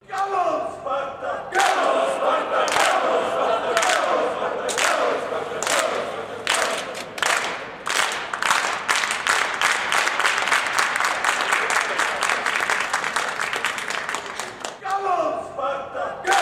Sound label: come on sparta, fanchants